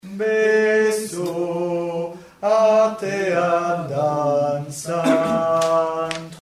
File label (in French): Hommes mesures 74-79; Bibi